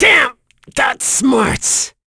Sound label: Vox Dead; kingsraid; voices heroes en